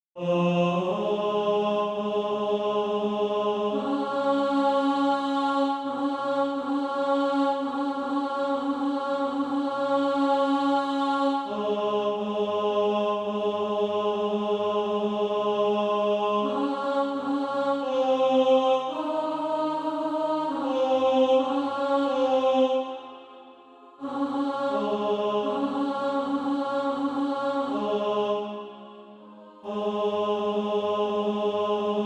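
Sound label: tenor, Practise Music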